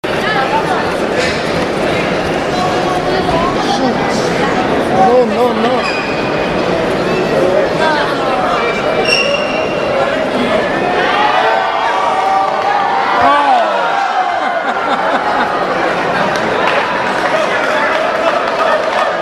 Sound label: robot goal